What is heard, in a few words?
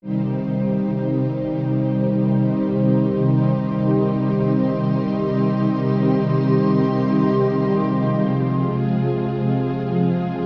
strings, Sad